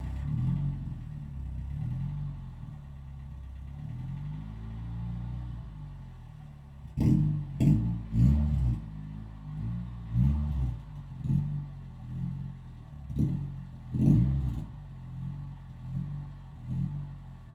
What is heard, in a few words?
Muscle Car Loud Revving Engine Noises